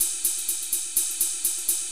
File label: HOUSE CYMBAL 125; Beats